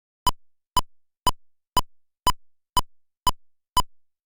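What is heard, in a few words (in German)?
klick t120 einzähl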